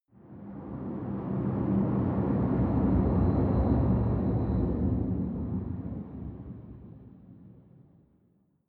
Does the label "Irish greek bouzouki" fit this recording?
No